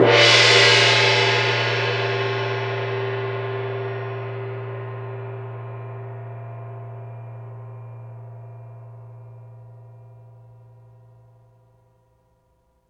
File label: CYM MED, cymbals; Roland LA; CYMBALS TAMS